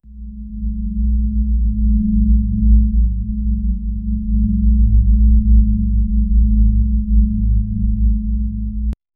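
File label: a steady low volume ambient, tone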